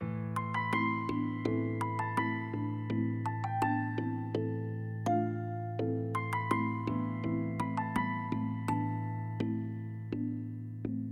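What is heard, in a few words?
background music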